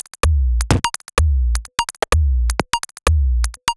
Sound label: Nibble 127bpm, House Drumloops; 90 s Sample CDs; Zero-G House Fabrik Drumloops; ZG Drumloops